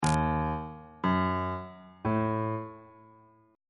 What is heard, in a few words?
g flat dorian chord 6 is e flat diminished on bass clef